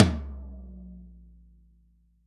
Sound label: Tom